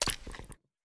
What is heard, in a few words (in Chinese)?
猫进食声